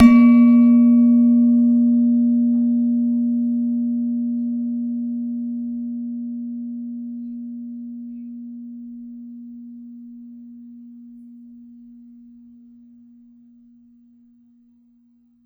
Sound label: Gender, Gamelan